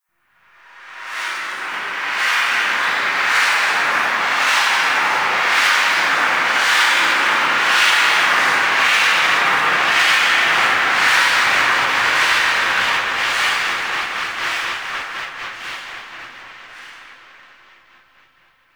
Lunaro Echoes; Ambience; Warframe; TheNewWar; Zariman

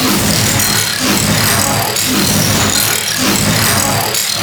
Mounted Shockwave Ability Proj Loop; Warframe; Duviri; Dax Mounted; Abilities

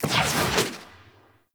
Camera Spot Move; Gameplay; Warframe; Chimera Prologue

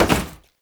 Step Metal, Foley; Warframe; Oraxia

